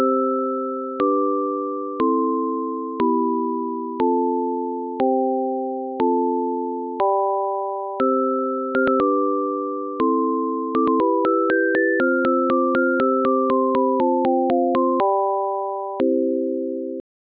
canon pure frequency with chord; Audio Signal Generating and Processing Project

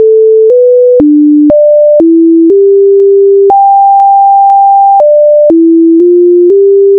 Hail Purd norm

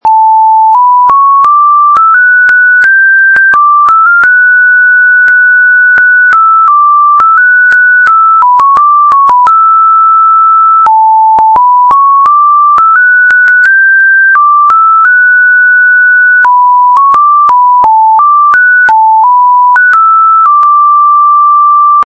High Pitch

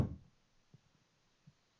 impulse; Bane

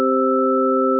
C chord; Audio Signal Generating and Processing Project

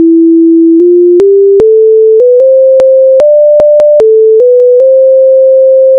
Hail Purdue

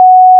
High pitch

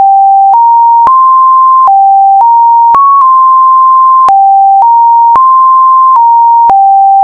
Smoke Water Transformed

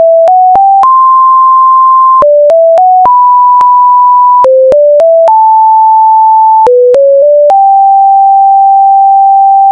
melody transform; 301 Melody

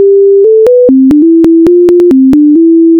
Hail purdue fast

